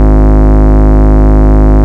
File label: Bass, Root G#; Vengeance Samples